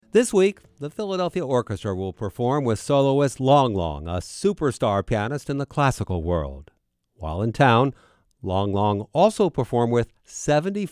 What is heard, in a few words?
piano